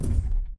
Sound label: footstep jog; Titanfall 2